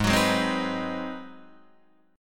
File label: Ab 7th Sharp 9th Guitar Open D, chord